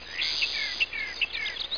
deer program error

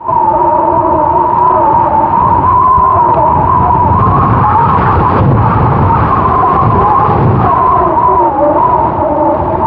whistle wind; PC Gamer IT CD 41; Daikatana

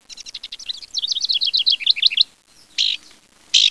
House Wren; Bird-Songs101; Common Birds 2

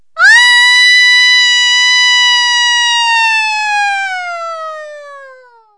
Falling Yell (Female); Cartoon; Yells

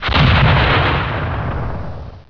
EXPLO

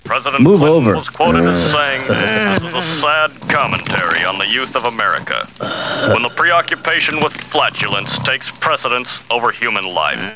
flatulns

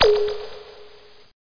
success; VCDEasy

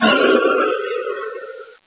wizard death; Quake; PC Gamer 51

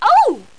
ouch

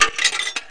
SHOP ON; rrdemo

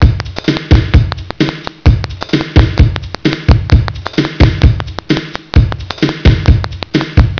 Drums; Drums & Stuff

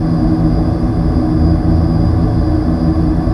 engine; imp

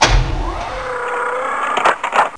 switch; duke nukem total meltdown

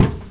tin hit

